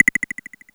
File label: CLICKER, drums; Big Fish Audio; Synth City